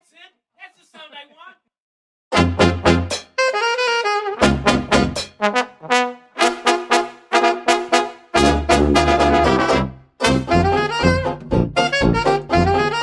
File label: Jazz Band, Stomp